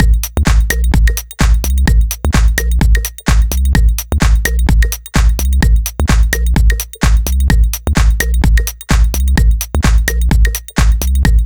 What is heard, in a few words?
Techno Mix; Mutekki Media; Vengeance Electroshock Vol.2